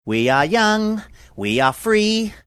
singing we are young we are free

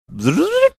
mimicking rewind sound drop

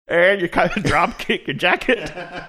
singing drop kicked your jacket

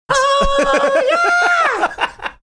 singing ah yeah high pitched

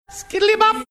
scatting

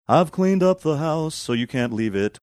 singing i've cleaned up house so you can't leave

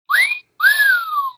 wolf whistle

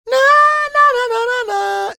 singing series of notes

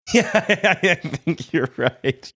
laughing saying i think you're right

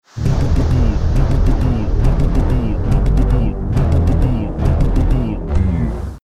mouth tympani; doctor who series xi

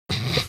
snorting quietly

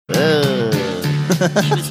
vocalizing

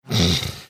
snorting

